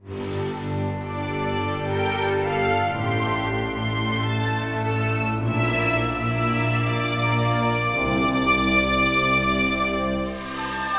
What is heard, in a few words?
Bgm Ending; Ngds Ending2